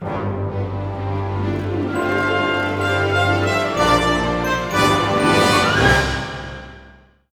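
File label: 132, orchestra; East West; Scoring Tools; Magic Start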